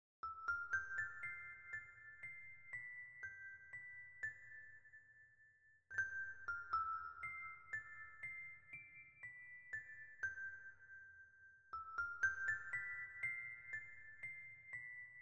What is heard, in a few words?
kalimba